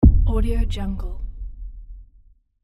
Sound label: low bass hit